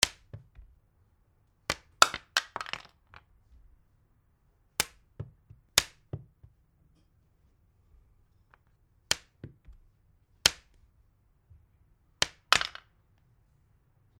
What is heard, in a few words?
stone